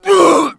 death; mohaa; dialogue

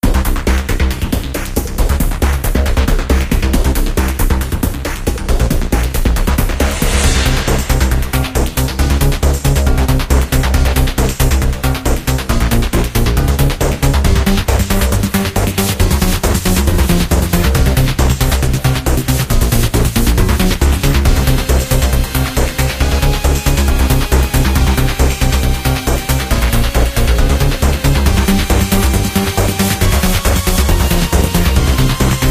space dimensions 8bit; game; cdogs

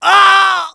death; mohaa; dialogue

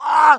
hit, pain; mohaa

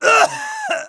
hit, pain; mohaa

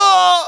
hit, pain; mohaa

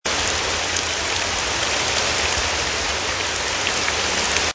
Rain Ext; amb; mohaa

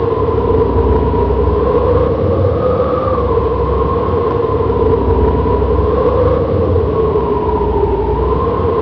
ambient wind; hurrican

